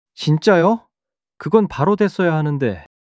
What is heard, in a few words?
surprise